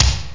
bass d

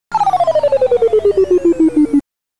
Shut Down; Click Magic 1.0.1

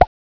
POP; robots

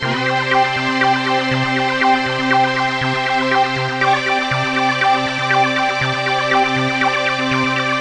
Synt; SYNTH; 120 BPM

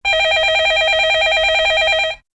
vmail

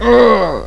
npc death male; Odyssey

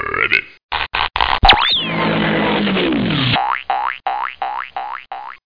ribbit; T.J. Finds a Friend

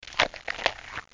splut; alienbreed3d2

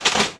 lever, Pinball; Pinball Science

